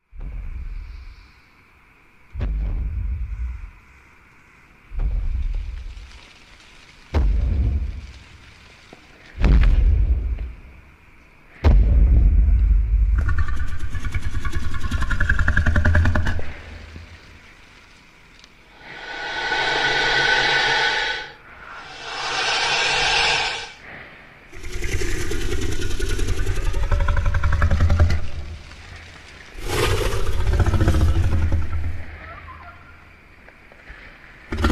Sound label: Carnotaurus Sound Reconstruction with ambience